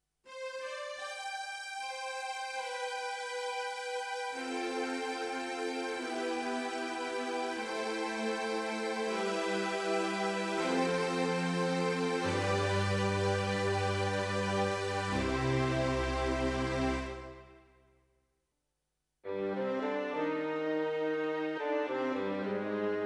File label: Strings